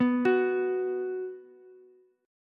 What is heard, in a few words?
B 5th B down Ukulele; arpeggiated; JGuitar